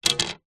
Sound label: wood pine plank short thin 40mm x 10mm set down wood decking; foley